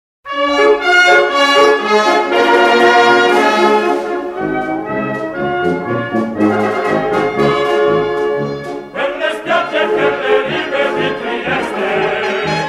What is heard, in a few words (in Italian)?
Coro e Banda degli Alpini; Le Campane Di San Giusto